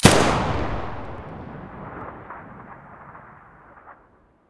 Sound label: muzzle sa80 stereo